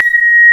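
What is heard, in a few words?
phase piano; fairlight cmi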